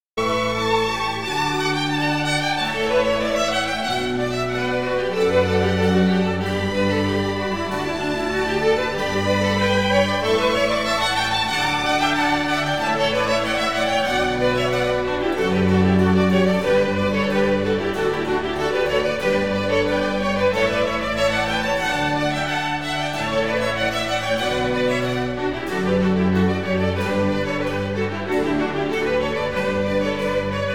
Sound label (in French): Canon, musique classique